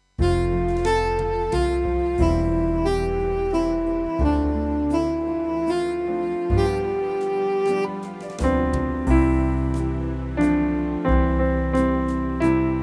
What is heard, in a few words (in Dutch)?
Met Piano